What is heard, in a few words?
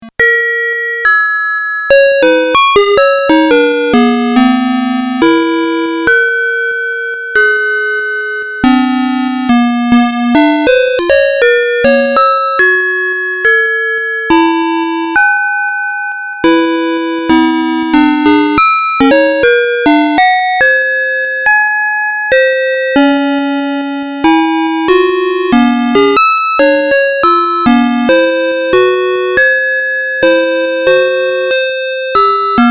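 Apple2, circuit bending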